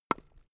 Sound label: wood staff walk stone